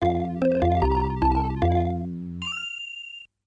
セーブ FM音源版; fray msx remastered soundtracks 2008

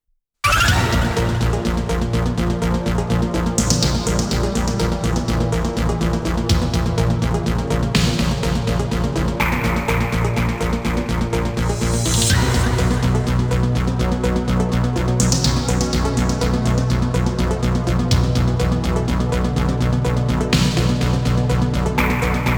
暗視ステージBGM; konami amusement sounds 93 summer

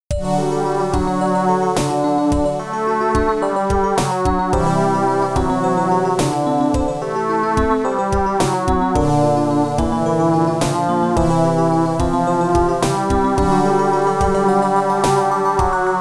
ゲームオーバー; C-ryoku Kensa V3; X68000

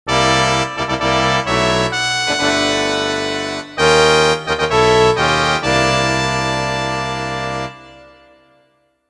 豪華なファンファーレ; Monster Farm 1 2

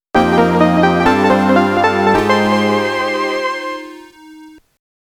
ファンファーレ

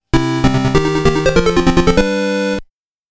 アイテム ファンファーレ

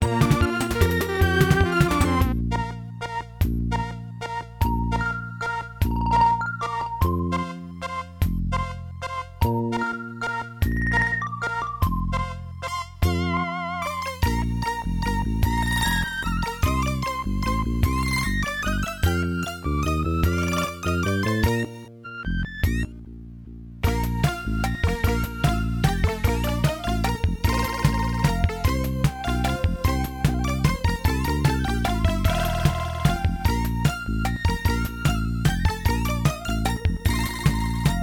村／町 FM音源版; fray msx remastered soundtracks 2008

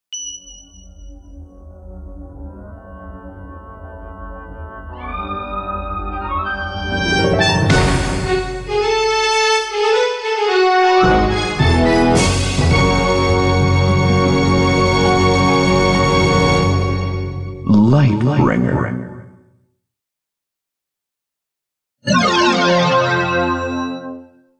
タイトル～Insert Coin; Taito Retro Game Music Collection 2; Action Cluster; 「ライトブリンガー」

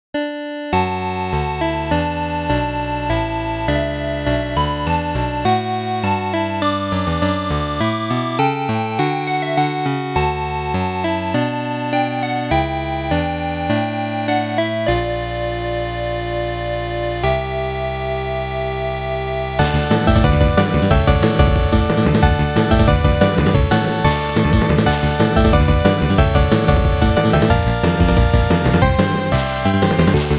PC Engine Mix

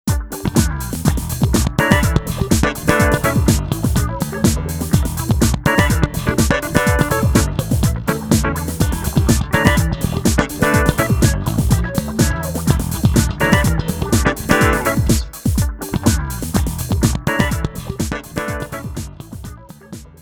リザルトBGM; Guitar Freaks V2; DrumMania V2

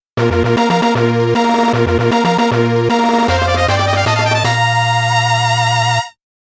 ステージ クリア; へべれけのおいしいパズルはいりませんか; sunsoft music collection vol.3

